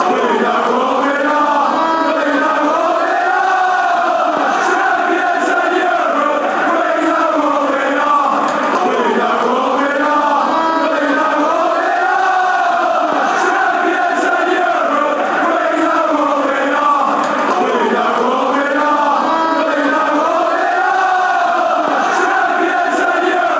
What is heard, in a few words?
we know what we are, fanchants